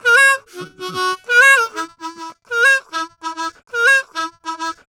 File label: Harm 098bpm A, Harmonica; Quazibeat - African Secret